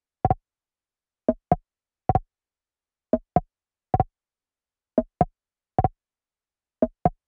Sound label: Drum Loop Perc 130